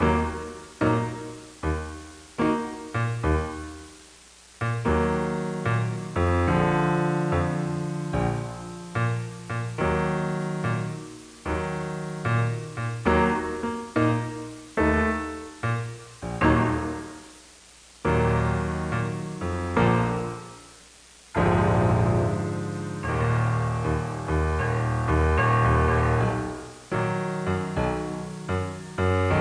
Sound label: Piano; Clue Chronicles: Fatal Illusion